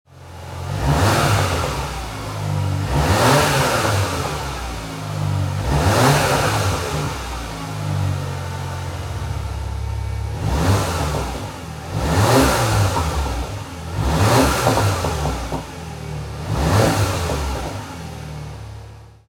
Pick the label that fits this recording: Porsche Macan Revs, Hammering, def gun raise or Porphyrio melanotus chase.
Porsche Macan Revs